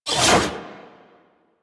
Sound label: Warfan Whoosh; Warframe; Tenno; Broken Frame